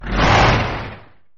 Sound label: roar On